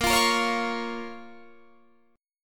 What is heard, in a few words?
Db 6th